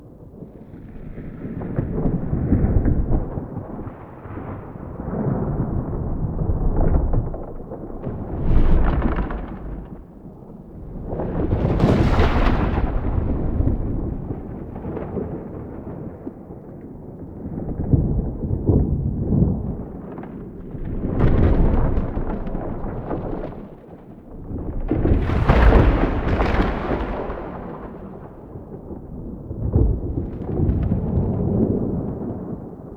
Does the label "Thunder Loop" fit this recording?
Yes